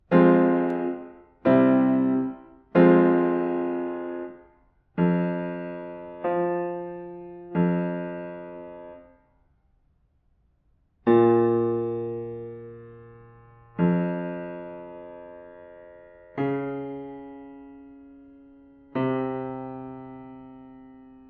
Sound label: diatonic collections